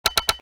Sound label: machine gun empty; Original TK Sounds